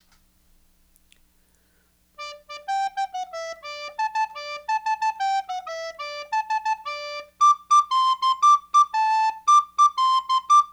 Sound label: yamaha sop; lhomme arme